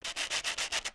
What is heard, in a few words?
Fidget Head Scratch; emotes; Overbuild